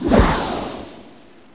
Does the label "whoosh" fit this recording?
Yes